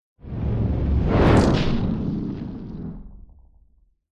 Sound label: cinematic whoosh powerful hit; zapsplat